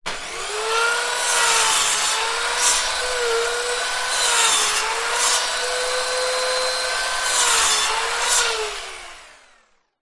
industrial circular saw buzz saw cut wood; zapsplat

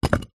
brick stack on top of another; foley

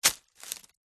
single footstep tread on dried leaves; foley; zapsplat

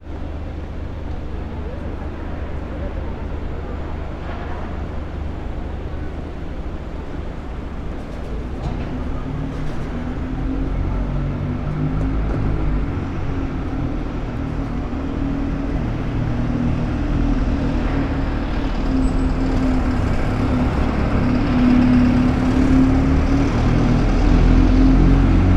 ambience urban town centre quiet; sound effects one

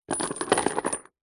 industrial chain medium sized set down on wood; zapsplat

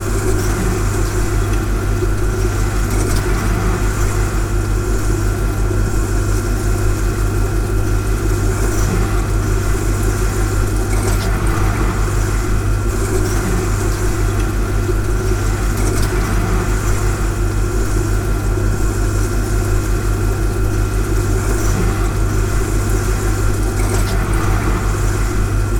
refridgerator hum noise recorded inside

